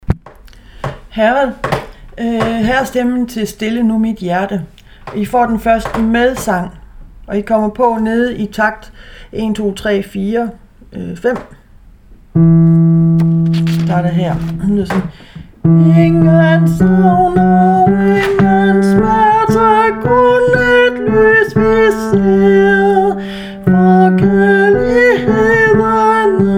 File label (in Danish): herrer med sang